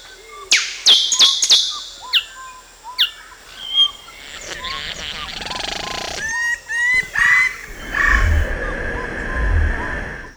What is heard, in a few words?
Birds of the rainforest sound